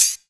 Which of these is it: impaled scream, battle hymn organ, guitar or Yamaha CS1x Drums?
Yamaha CS1x Drums